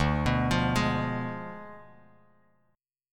D Diminished 7th D down Bass Standard; arpeggiated

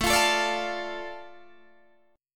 A Suspended 4th Sharp 5th, A, Guitar, Open D

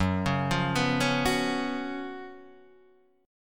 Gb Major 7th Suspended 4th Gb, guitar; GbM7sus4; arpeggiated; 2,4,3,4,2,2; JGuitar

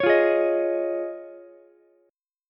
F Minor 7th Sharp 5th, Ukulele; JGuitar

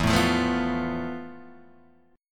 F Major Sharp 11th E

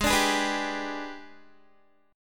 Ab Minor Major 7th Flat 5th, down, Guitar; strummed; AbmM7b5

